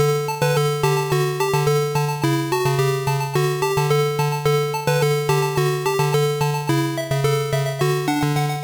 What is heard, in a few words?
Taiko Performance Song